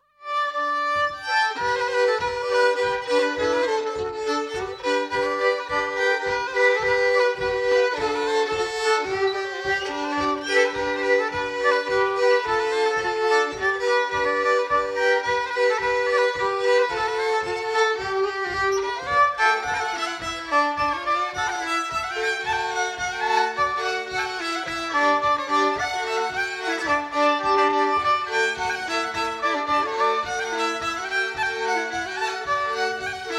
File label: Polka